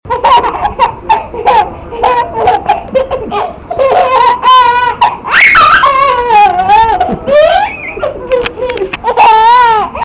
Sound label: laugh